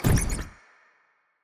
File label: projectile; Shelter